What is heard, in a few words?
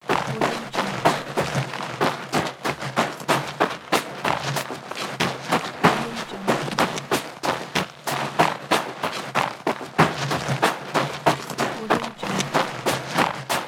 running on a snowy road